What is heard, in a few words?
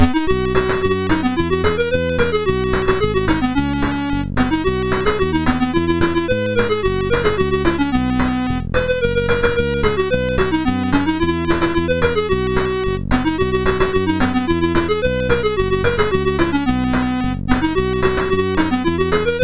sid MOS6581R4, GAMES; Aussie Games